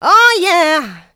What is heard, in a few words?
VOCA, Houseworx